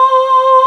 LA VOX

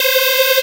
Synths Root C

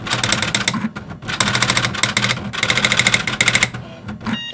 TYPING, OFFICE; AKAI S-Series CD-ROM Sound Library VOL-6

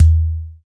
Udu Pot Lo, percussion; Roland BK-5

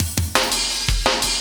loop, Drum & Bass, 170 BPM; Ueberschall; Drum & Bass Flash Trackz

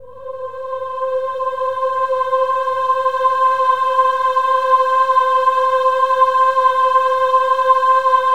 OH-AH C5 L, CHOIR; Spectrasonics; Symphony of Voices

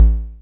dry perc, Quasimidi Rave-o-lution 309

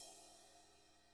BRUSH CYM, Drums; ILIO; Double Platinum Drums 1

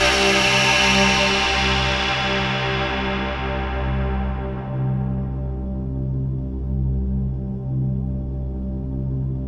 MW WASH C2

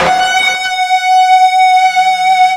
FUZZ F#4, Guitar; East West; Steve Stevens Guitar Samples Collection

